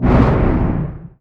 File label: energy torpedo hit, weapons; Stellaris